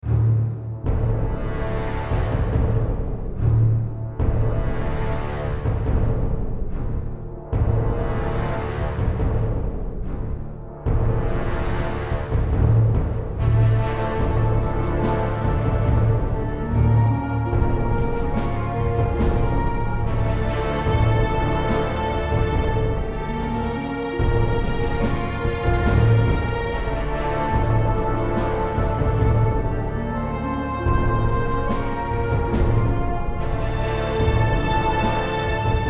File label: Battle; Galactic Civilizations II